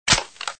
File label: pump